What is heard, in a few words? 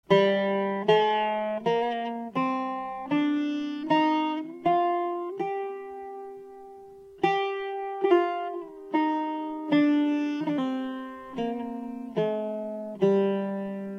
maqam bayati G Buzuq; Maqam Bayati on G